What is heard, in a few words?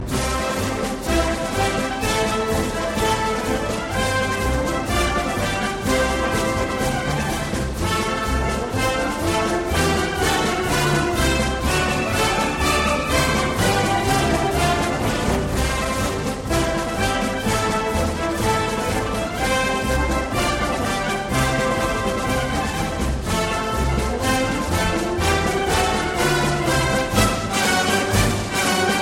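Anchors Away, US Navy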